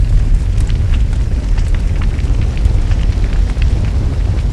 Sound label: sword fire loop